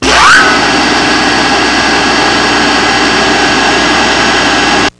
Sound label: strt belt; Tony Quinn's Virtual Universe; Wayzata Technology